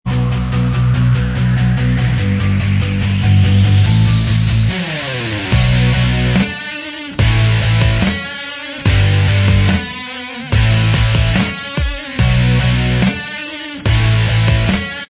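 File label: instrumental, backing tracks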